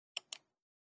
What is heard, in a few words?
click